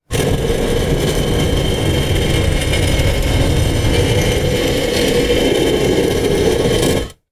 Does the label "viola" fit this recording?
No